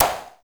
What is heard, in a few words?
noise fx; Masterbits; Switch FX